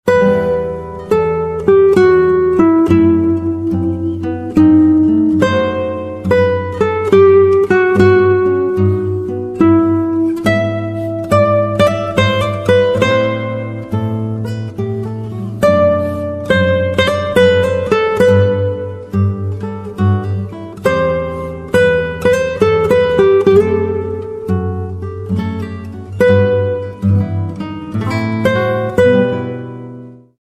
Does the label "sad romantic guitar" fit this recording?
Yes